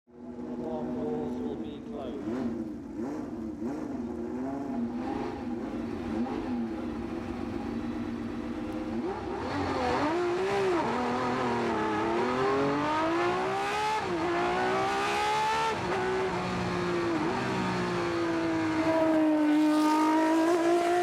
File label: Screaming V12 Matra MS670B deafens the crowd; FOS